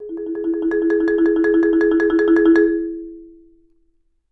Marimba roll G#3; Sampleheads - Dave Samuels Marimba & Vibes

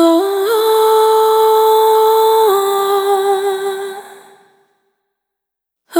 Single Voice Melodic Loops; Sony Creative Software; Pocket Diva World Class Vocal Samples; Discovery

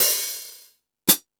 Metal Drums, E-MU Pro-Cussion; drum machine

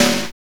snare R B, Roland snares; Northstar - Drumscapes Roland

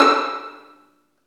String Vlns Pizz

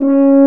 C#4 F.HORN; ORCH.COMP

